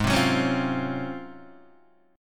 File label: Ab 13th Guitar DADGAD, Ab13 chord; strummed; JGuitar